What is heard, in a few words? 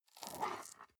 storage lid slide open soft, box; objekte